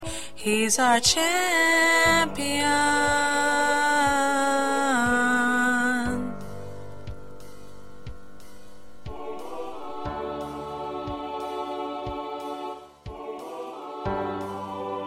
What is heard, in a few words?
1st Lead